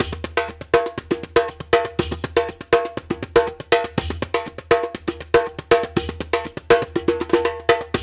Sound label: 120 DUM, Dumbek Belly; Spectrasonics; Supreme Beats; African Contemporary